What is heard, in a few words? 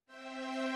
Strings Long